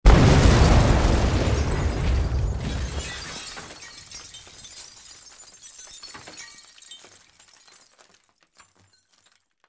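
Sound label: Explosion Glass Debris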